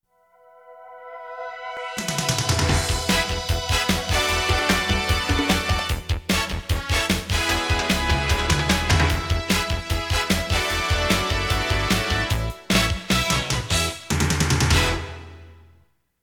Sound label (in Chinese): 激情的背景音乐